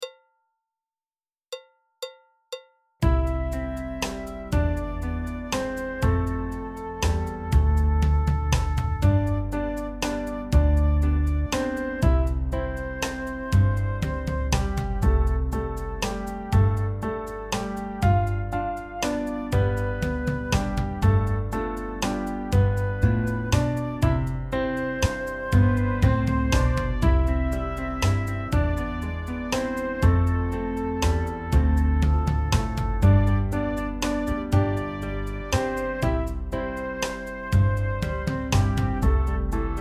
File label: Backing Track; cielito lindo solo